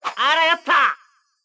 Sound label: coin; goemon; Mystical Ninja Starring Goemon